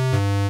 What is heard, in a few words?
cast failure; triple-aaa-games; hud